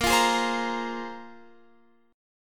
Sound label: A sharp 7th Suspended 2nd, Guitar, Open F; strummed; chordshape